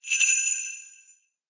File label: The Sound of Bells